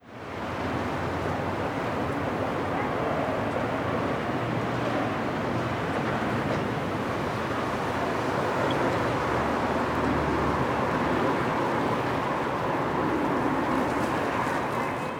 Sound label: Molokai Town